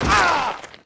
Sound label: FALL, Rider